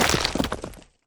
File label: skeleton die; wesnoth